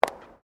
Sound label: FOOTSTEPS WOMENS HIGH HEELS PAVERS STEP; Footstep Foley; Human